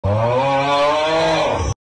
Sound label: augh snore